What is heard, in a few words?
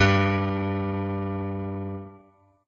piano; pianojs